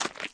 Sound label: flesh